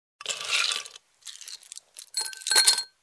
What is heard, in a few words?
Filling ice in cocktail glass